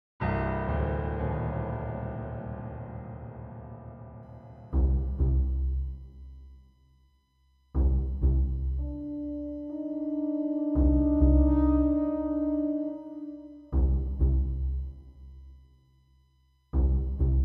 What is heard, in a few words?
detective thriller